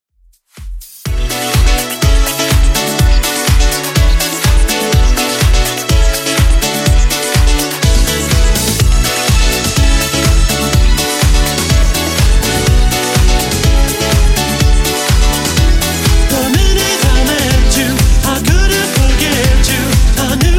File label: 90s Redrum 124bpm